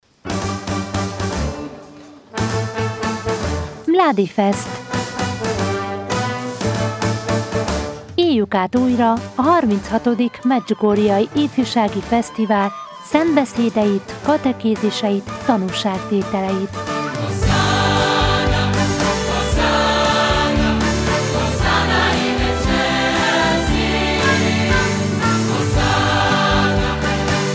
jingel; Mladifest

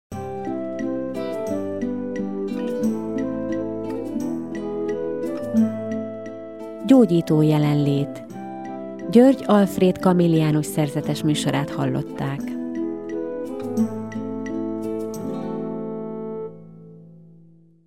jingle; Gyógyító jelenlét VEGE

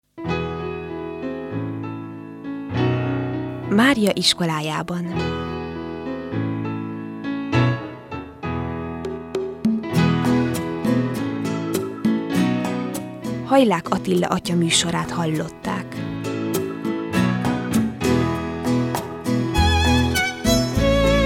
jingel; Maria Iskolajaban

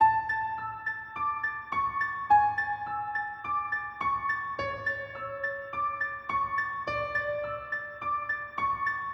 a major classical piano high keys loop 105 bpm; LOOPS

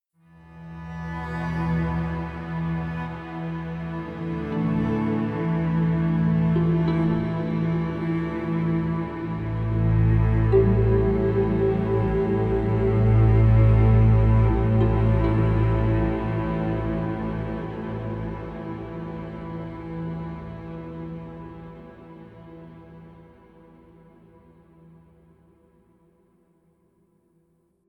praised; CSENDES

